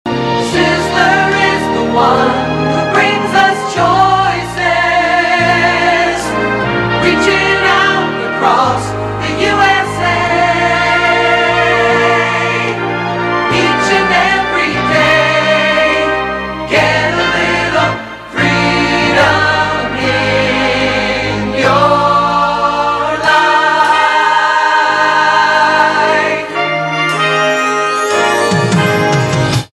Chorus; Sizzler 1991

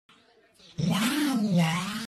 Distorted Lala

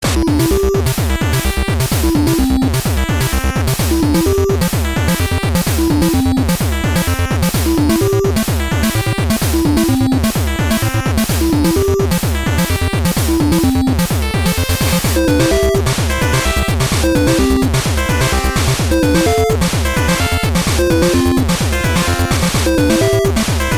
Chiptune

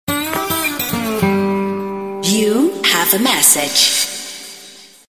Twang Msg

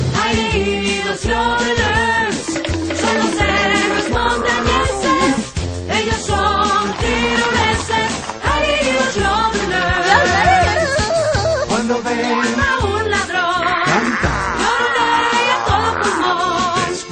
Jodelers; Heidi

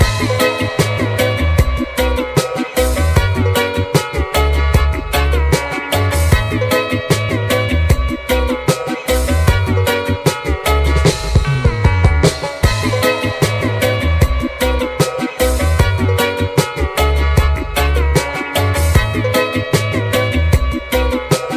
Asian Reggae

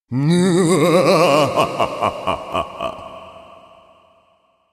Evil Laugh

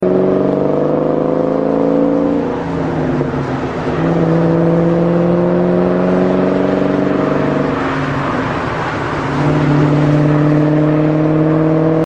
1967 Mustang Coupe

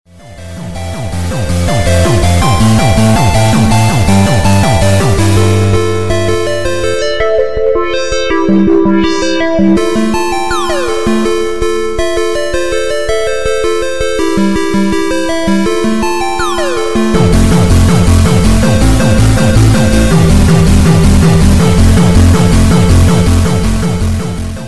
Techno; Nintendo

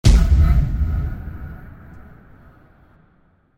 Evil Crack

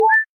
Bomb; Zelda

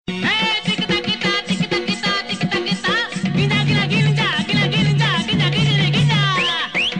Sambalpuri

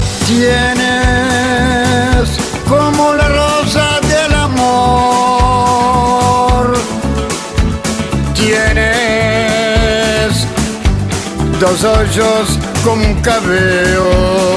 Father Sings

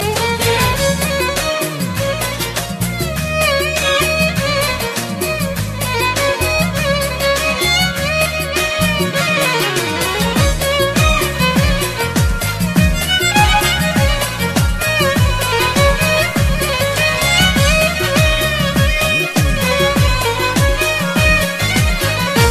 Bandari

